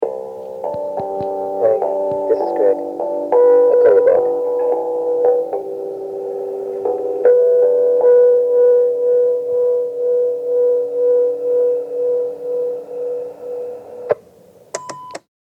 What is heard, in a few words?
bass harmonics